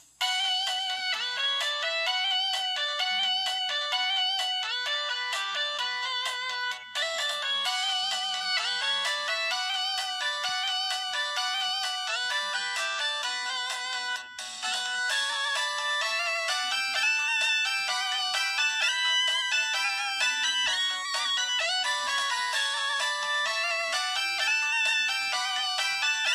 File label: LG VX8500 Tone